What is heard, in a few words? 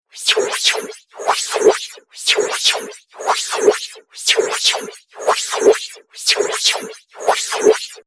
Alien Laser Loop; Laser Sound Sound Effects; Looping Sound Effect; Andrew Fleming